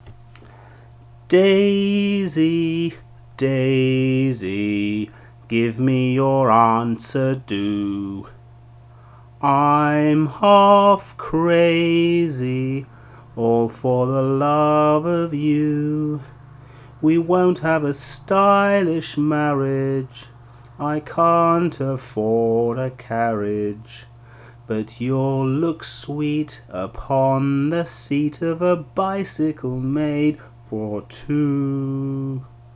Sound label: Sung 2 real Speech; daisy